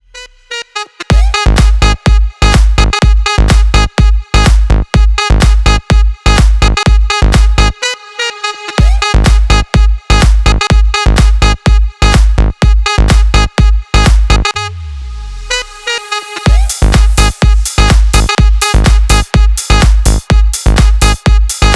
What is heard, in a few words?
saxy